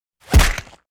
Schlag; Menschen

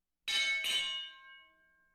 Rundenglocke; Sport

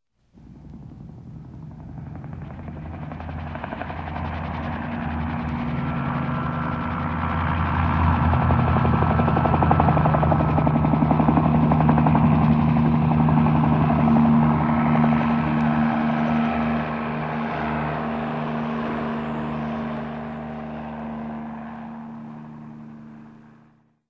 Hubschrauber, Verkehr

